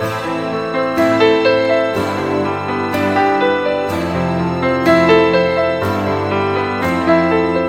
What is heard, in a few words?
piano, Sad